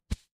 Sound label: ui swipe